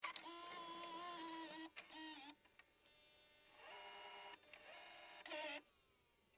HD Camera Load, Hd, Camera, Load, Cassette, Tape, Sony, Hvr-Z5